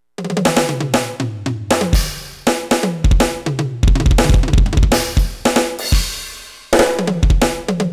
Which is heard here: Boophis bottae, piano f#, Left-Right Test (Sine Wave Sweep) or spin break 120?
spin break 120